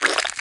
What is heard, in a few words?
decay